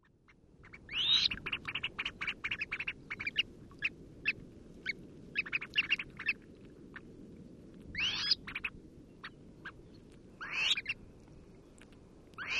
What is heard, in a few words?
Red Phalarope